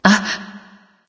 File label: nv surprise; bellona